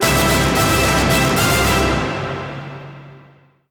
Classic Mode Victory Fanfare; Super Smash Bros. Anthology Vol. 37 Fanfares

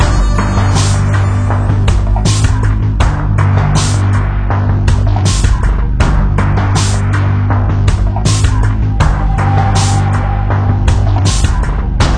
Tension Loop

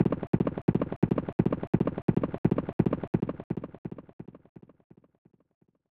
Horse Galloping; Jumpin Derby; snes

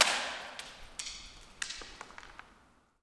glass break

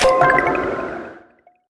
ui objective skip update soft; Just Dance 2018

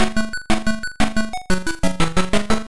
Yakyuken Game Lose; mahjong sisters; toaplan; arcade

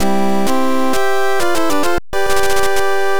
Bonus; Ginga Denshou Galaxy Odyssey; FDS

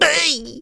take damage large; Scrat

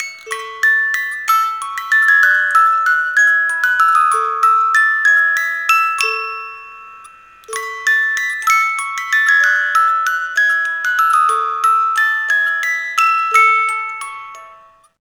Musicbox; Rage; Feed; Xgk

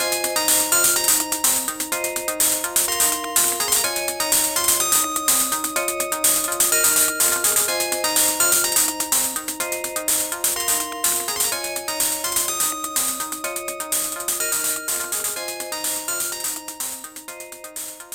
Ringtone; Flower, Sun and Rain

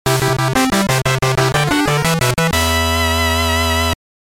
Course Start; Mobile Golf; gb

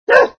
Bark; Blue's Clues; Blue's Checkup

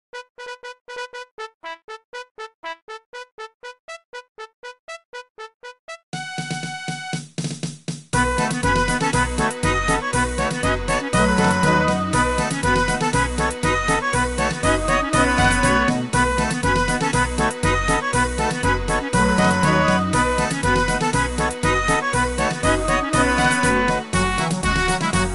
Fanfare

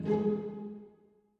Rock Step

